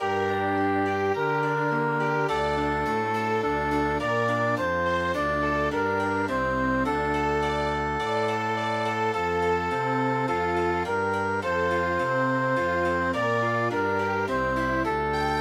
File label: Chamber Music